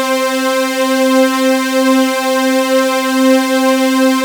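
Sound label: MKS SAW SQUARE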